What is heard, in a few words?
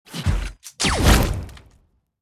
Poison Bolt